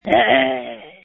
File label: Scream